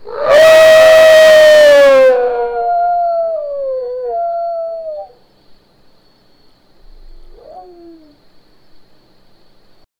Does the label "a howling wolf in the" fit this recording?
Yes